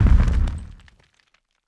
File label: catapult hit; propack